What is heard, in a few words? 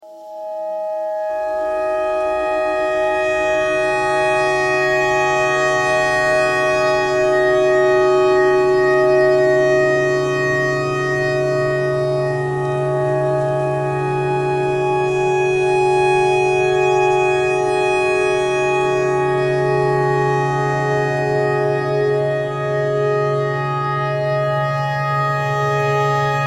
tornado siren clarinet notes e thread simple